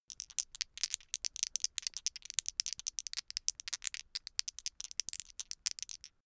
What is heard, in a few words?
dice shake hands open long